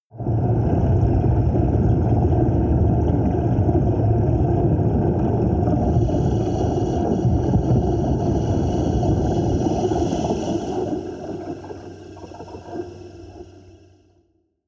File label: water fx dark